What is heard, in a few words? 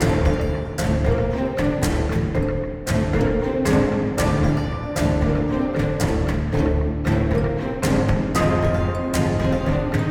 Ambient Action Loop; Frozen Flame; windows